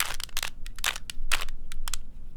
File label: Small Cube Twist, Rubik's Cube